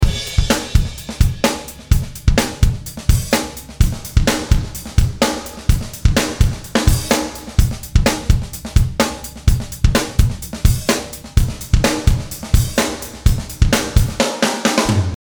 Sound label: drum; Waves; Abbey Road drum mirror